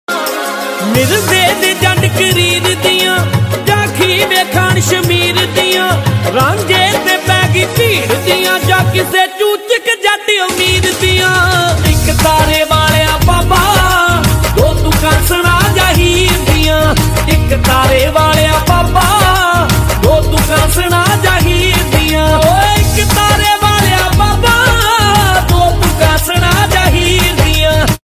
punjabi ringtone

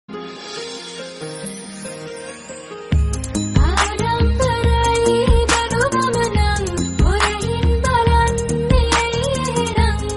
sinhalese melody ringtone

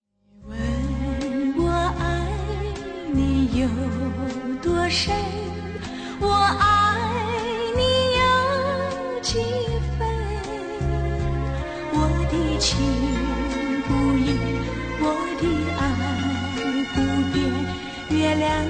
japanese ringtones

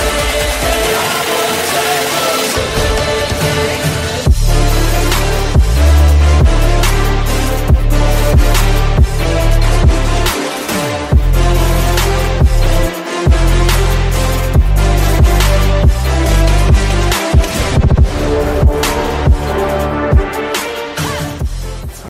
latest edm ringtone

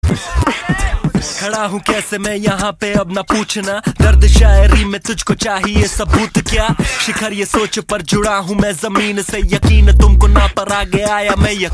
bollywood ringtone; gully boy

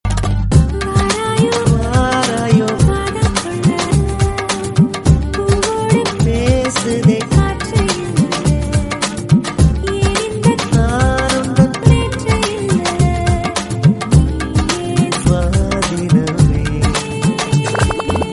tamil female remix tone

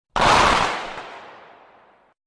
rifle shot sms ringtone

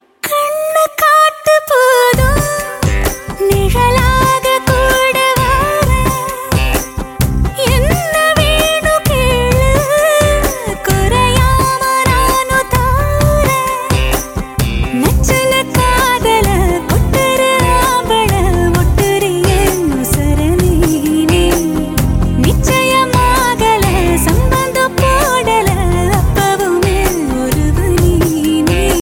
tamil tune ringtone

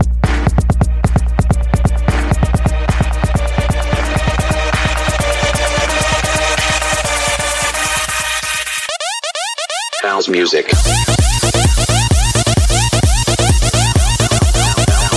iphone x house music ringtone

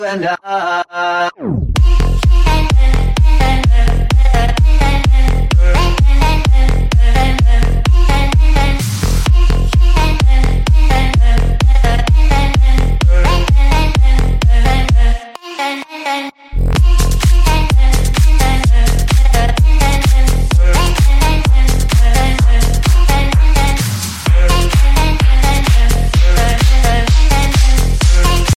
electro shuffle ringtone

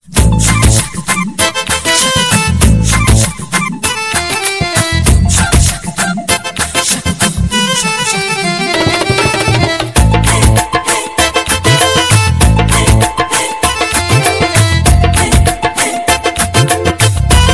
nice ringtone

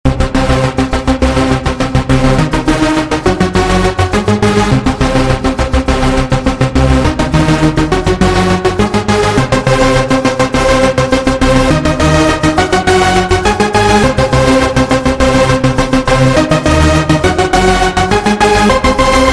nokia leaked ringtone

